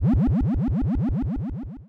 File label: wobble